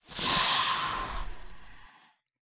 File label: Cry; Shuine